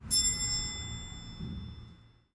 Timbre De Ascensor Al Llegar Elevator Bell Upon Arrival